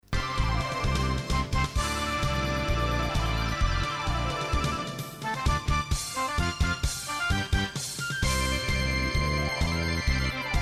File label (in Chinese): 歌曲翻唱 快板说唱 合音 甜美